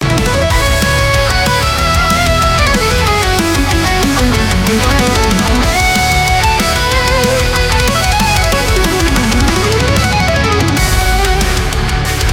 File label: 93.5bpm; monsterhunter